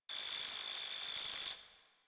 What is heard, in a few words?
Cricket